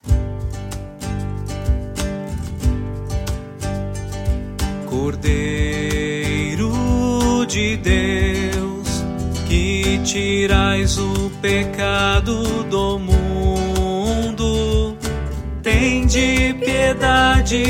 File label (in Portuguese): Cordeiro de Deus, cantos